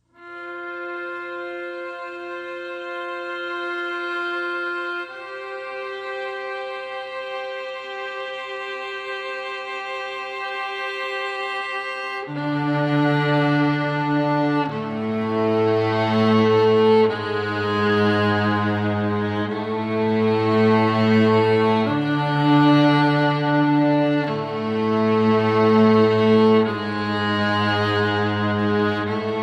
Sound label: Strings Only